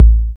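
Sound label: MPC4 bass drum; USB Soundscan vol.02; Underground Hip Hop; AKAI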